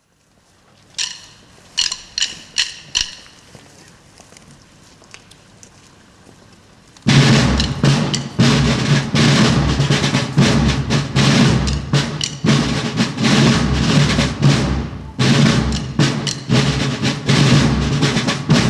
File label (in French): tambour baguette